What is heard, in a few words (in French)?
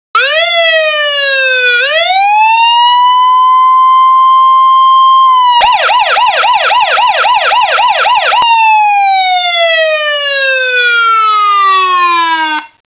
sirenne